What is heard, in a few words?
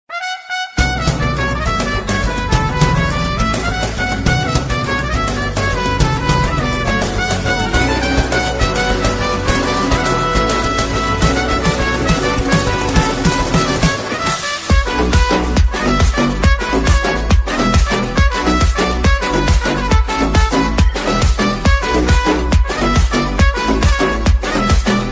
entry bgm ringtone; aavesham